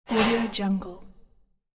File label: swoosh; minimal studio